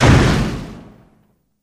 Flash Pot Flare Up, flamethrower

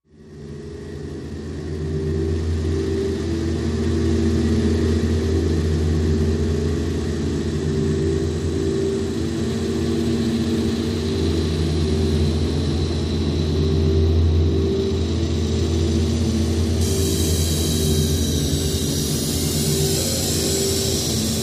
Amb Grinder Machine; electrical

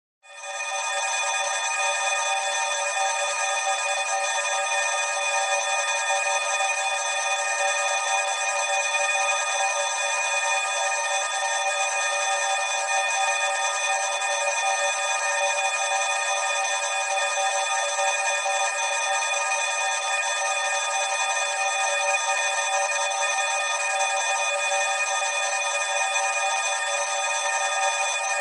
Computer Room Ambian

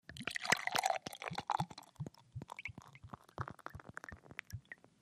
Beer Bottle Pour Glug; eating & drinking

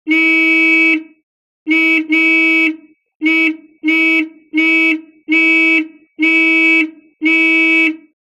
Horn Morris 8 Circa 19

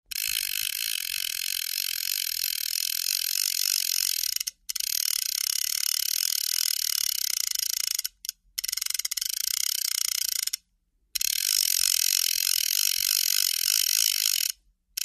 Fly Reel Crank Reel In, fishing

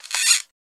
Squeak Twist Comedic

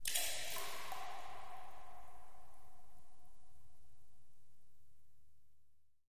Echoey Cave Drips Wa

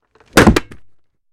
Football Helmet Pla, football

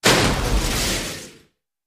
Glass Broken By Heav; zaps

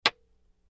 Wood Staff Hits Light, spears & staffs

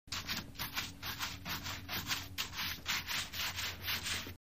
Light Scrubbing Brus, brush scrubs

